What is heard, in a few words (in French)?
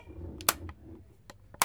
interrupteur clic